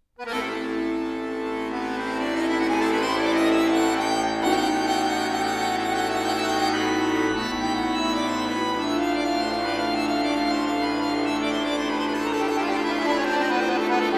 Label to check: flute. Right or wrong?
Right